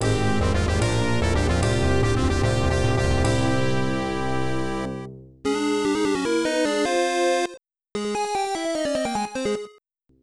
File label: Jingles; Xanadu 2 Revival Remix; PC-98